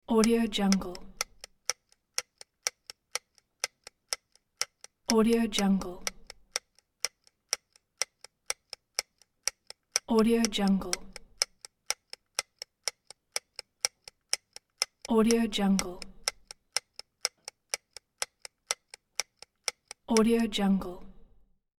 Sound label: timer clock ticking loop; domestic sounds